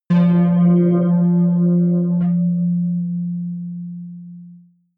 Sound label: synth bass bell high